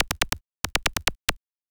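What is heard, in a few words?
SNARE ELECTRO 140, Techno Drum; Techno2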